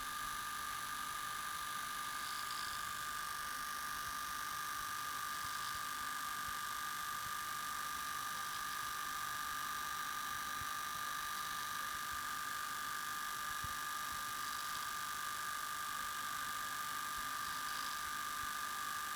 VCR Head Playing; SFX; IRL Recorded

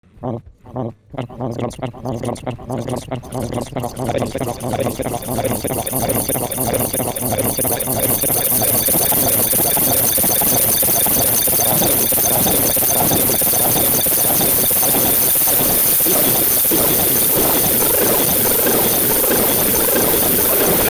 Audio Repeat, Experimental

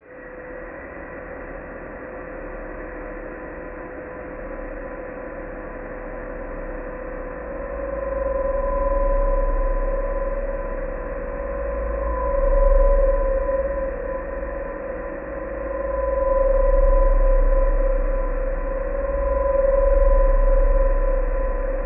Rusted Ship, Ambient; SFX; Ambient - General

